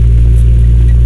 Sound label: Diesel